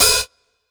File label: Lo-Fi Quick Open Hat; DRUNK DOG